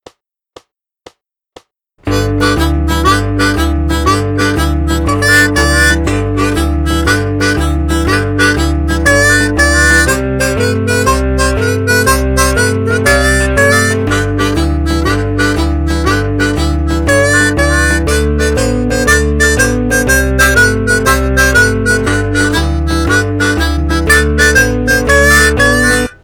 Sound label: Choo Choo Blues 120bpm